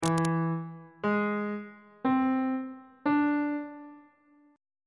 d double sharp augmented 7th chord intervals on bass clef